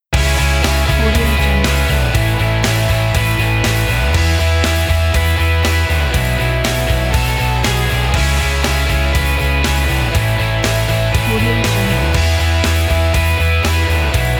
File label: dreamy and spacious